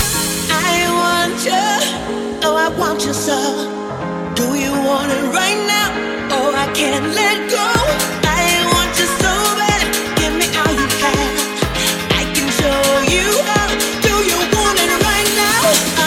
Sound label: Tech House 124bpm Bbm